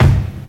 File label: Punchy Kick Single Hit C Key, Royality free bass drum one shot tuned to the C note.